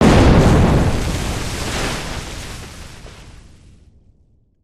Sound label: water splash distant; destructibles